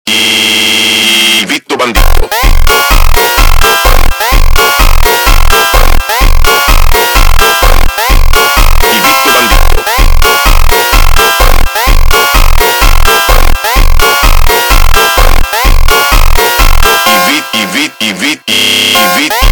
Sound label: Funk slowed